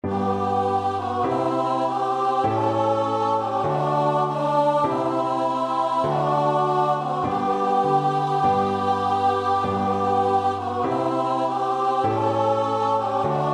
choir

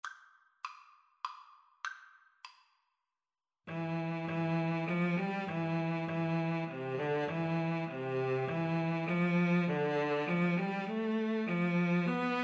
away in a manger, Viola Cello Duet

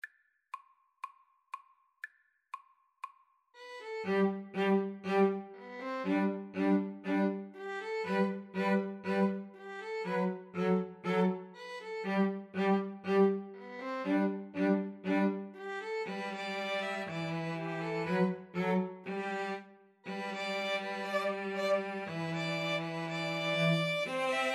turkey in the straw, String trio

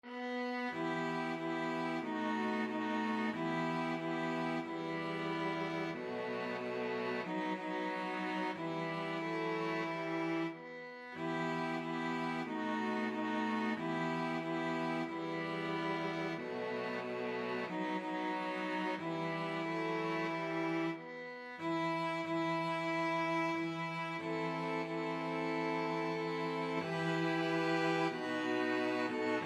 string quartet

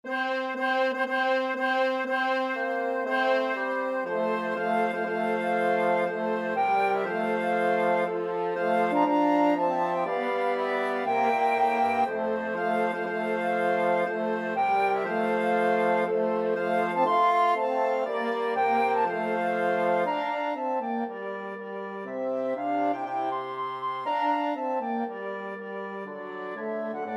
wagner wedding march, wind quartet

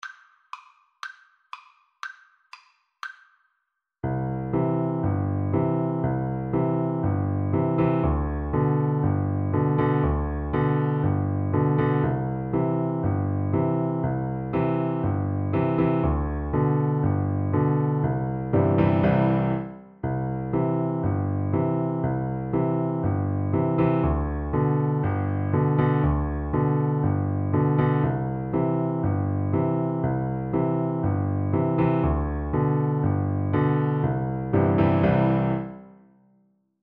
piano duet

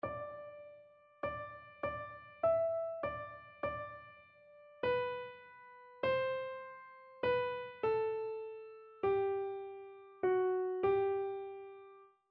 great and mighty, keyboard

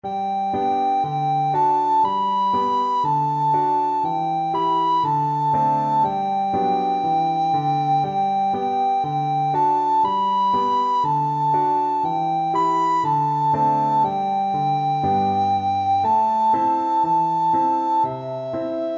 au clair recorder

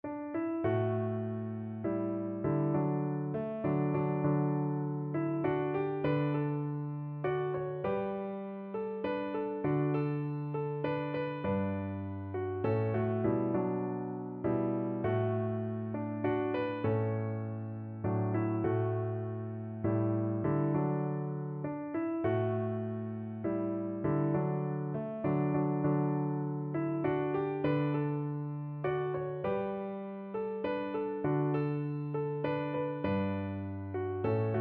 Shenandoah piano

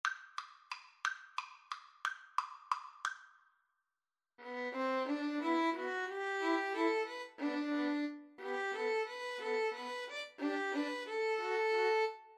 serenade, 2 Violins Cello; tchaik

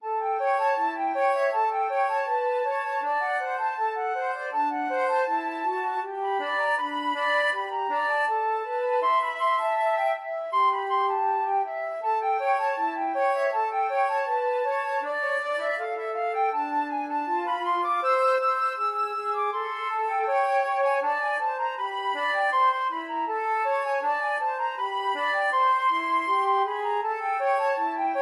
flute duet

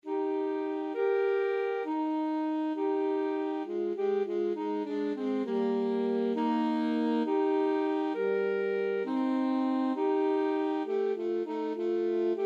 alto saxophone duet

